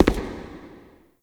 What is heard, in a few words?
Shadow Figure Steps; Warframe; The New War; Characters; Zariman Shadow